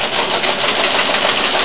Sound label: MECH SLOW, MACHINES